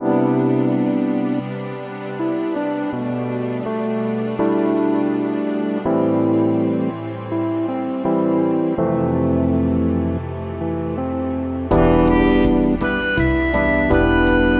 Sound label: cafe study loop